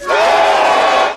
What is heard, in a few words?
PEOPLE YELLING SUDDENLY